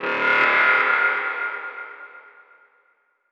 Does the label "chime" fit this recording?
Yes